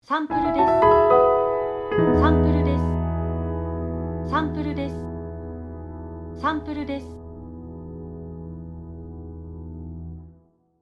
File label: Logo Piano